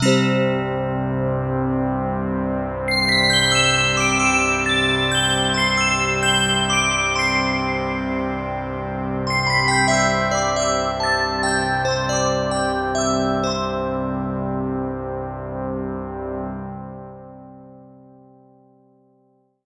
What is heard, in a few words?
Bell Pad